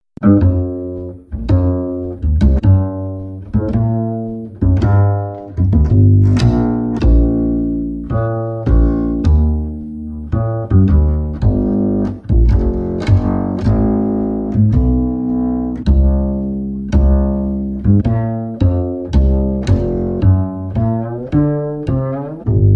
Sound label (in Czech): Kontrabas si vykračuje